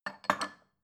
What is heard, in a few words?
bowl shelve